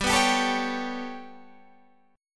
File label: B 7th Suspended 2nd Sharp 5th, chord